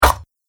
Dagger Thrown Into Plate Mail; Knife Sound Effects

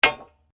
SLEDGEHAMMER METAL IMPACT HEAVY; Working with a sledgehammer; Hammers; Tools

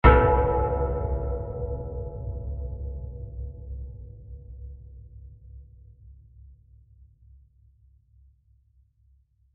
Metal Bell Impact; Blast Sound Effects; Blasts (Sound Effects:Impacts)

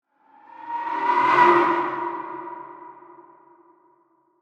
Whoosh Ghostly Howl, Horror, Haunting, Pass By; Ghosts; BlastWave

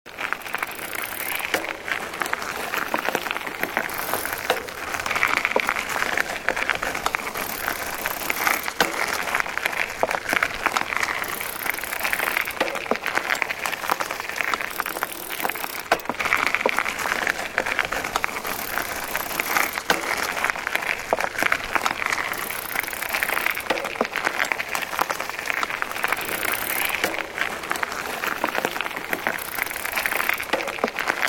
Cycling along a gravel path; Bicycles; Vehicles

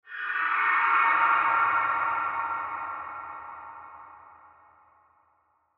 Ghost Cry Haunting; Horror; Ghosts; Blastwave

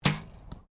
Archery Competition Bow Firing; Various archery sounds; Miscellaneous Sports

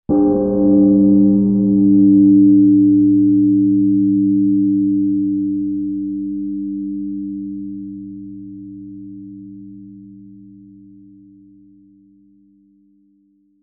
Church, Bell, Ring; 5.1

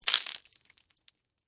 Water Spill Splash, Small; Splashes; Objects; BlastWave

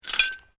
Glass move; Material components for constructing more complex sounds; Materials

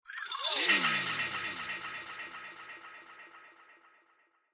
Laser Pistol Cartoon Blast; Laser Pistol Blast Descends Metallic Elements Cartoon Scifi Science Fiction; Lasers; BBFX

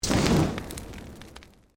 Created Magic Spell Fireball Impact

